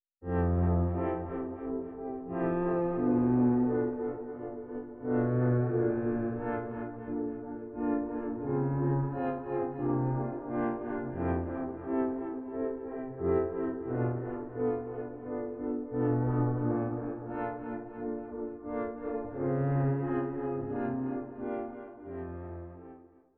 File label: piano